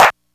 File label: DPM48 CP, Sakata DPM 48